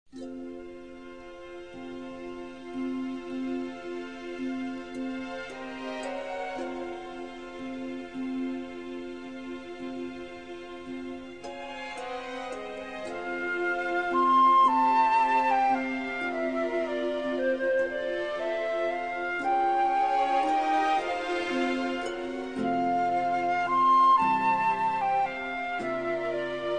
Orchestra, Strings

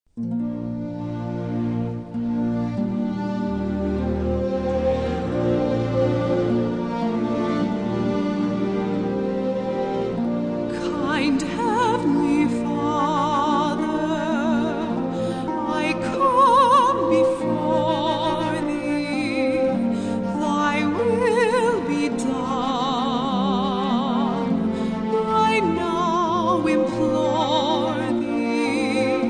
Singers And Orchestra

